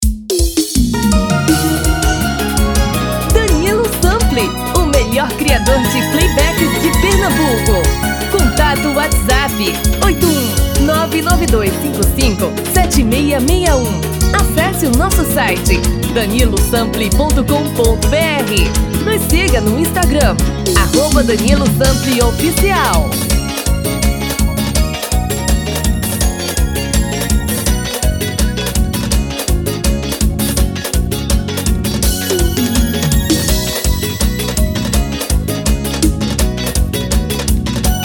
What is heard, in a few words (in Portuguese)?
tom feminino